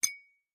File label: toast glass ting